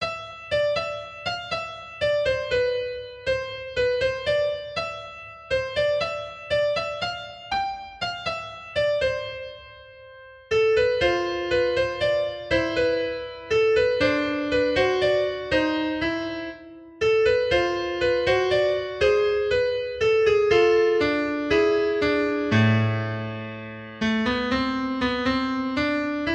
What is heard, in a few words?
The Piano Duet